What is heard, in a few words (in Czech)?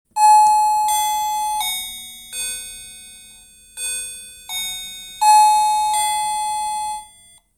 melodie